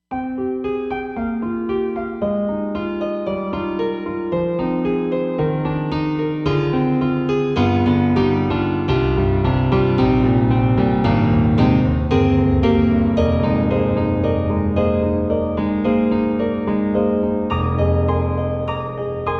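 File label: Roland SD-50 Stage Piano 2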